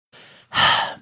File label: sighing